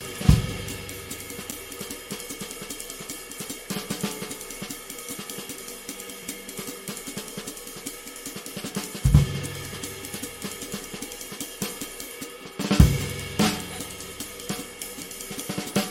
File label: drum